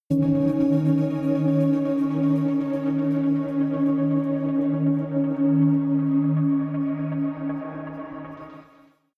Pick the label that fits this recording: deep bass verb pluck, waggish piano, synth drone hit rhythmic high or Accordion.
synth drone hit rhythmic high